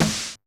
snare, Roland MC-909

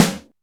Alesis DM5 Snare; drums

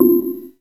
Z1 Snare, Korg Z1

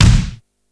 kick, Yamaha RY-8

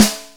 Korg Triton, Standard Kit, drums

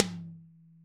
tom, Roland MC-909

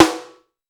Boss DR880 snare; drums

